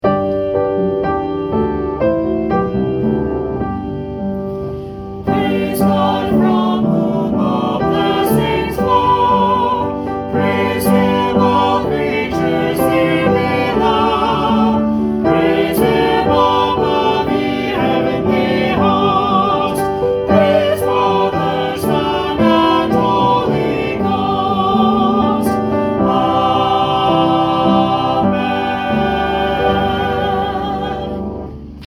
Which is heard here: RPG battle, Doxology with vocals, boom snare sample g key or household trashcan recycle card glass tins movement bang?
Doxology with vocals